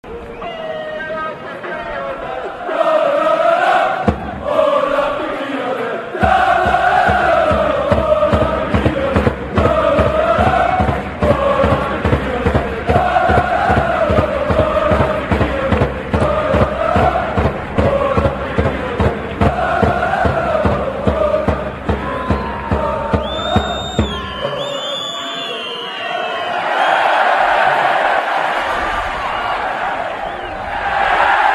Olympique lyonnais lololo, Chants

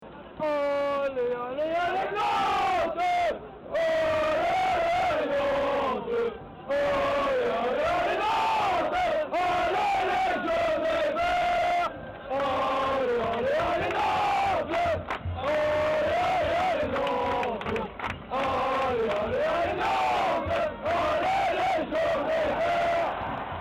Allez Allez Allez Nantes, Chants

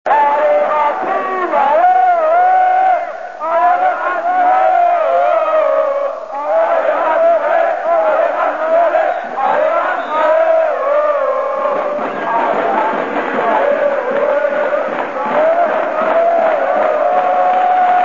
allez racing allez oh oh, Chants; Strasbourg

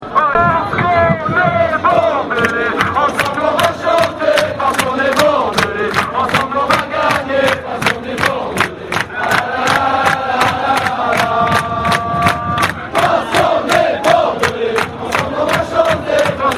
Parce Qu'on Est Bordelais, Chants

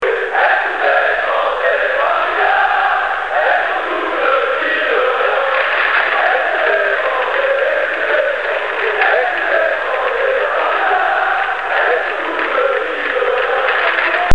ecouter chanter les parisiens

